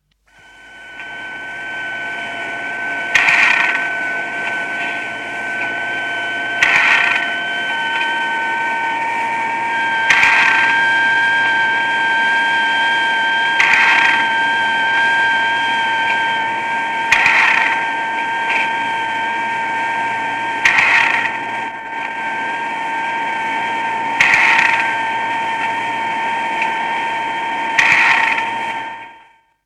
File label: Taurus Redo, Taurus Redoubt Satellite, telemetry effects from satellite; Miscellaneous Electronic; BBC